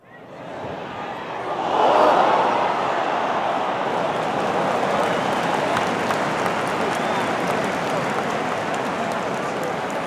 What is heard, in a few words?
Large ooh and applause from crowd; clapping